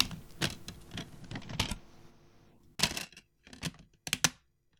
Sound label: Cassette Tape